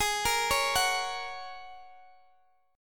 Ab 7th Suspended 2nd Suspended 4th, Guitar, DADGAD